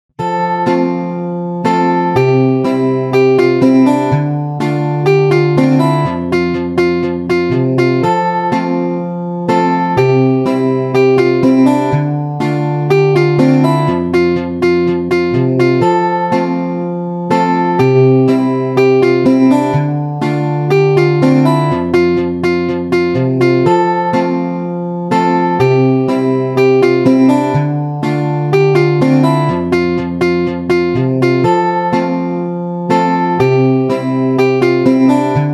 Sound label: Ringtone; Samsung Galaxy A13